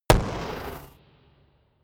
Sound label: gun pop gun shot